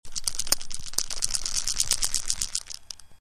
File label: bell tennis ball; three-pack